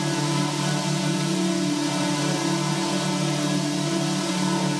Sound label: Pad Loop D, shimmer and sparkle; Pad Loops